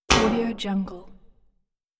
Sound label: short metal impact; hollywoodedge